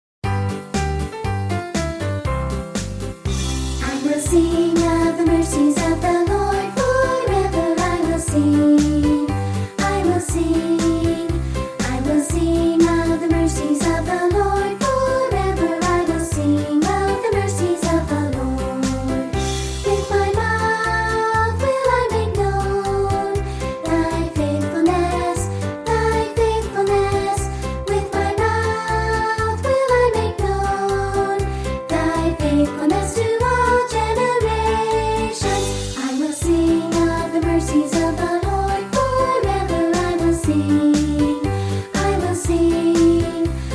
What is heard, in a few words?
Childrens Choir